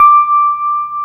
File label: PIA DX E-PIANOS; Sound & Vision; Gigapack I CD 2; PIA DX-Pianos